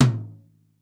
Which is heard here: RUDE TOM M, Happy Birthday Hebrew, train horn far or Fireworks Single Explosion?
RUDE TOM M